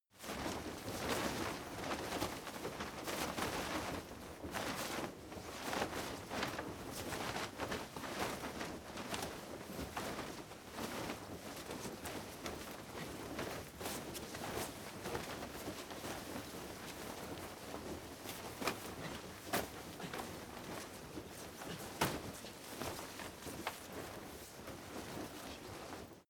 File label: crowd movement sequence